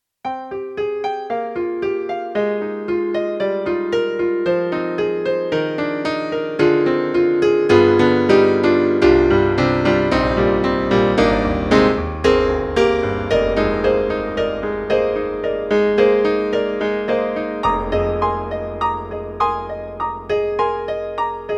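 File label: YAMAHA MDP-5 XG Mellow Grand Piano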